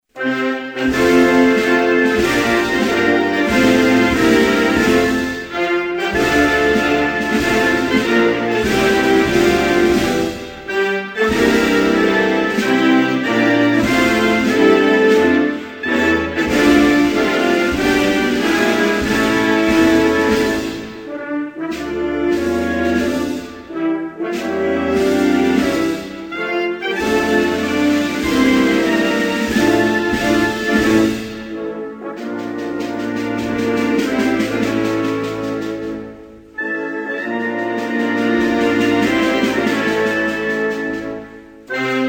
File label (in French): Congo, Hymnes nationaux